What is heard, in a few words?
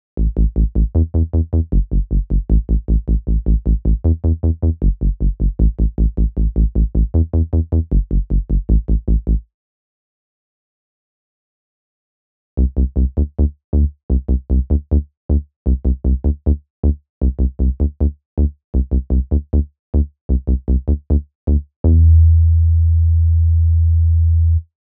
synthi bass